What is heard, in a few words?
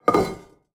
urn large open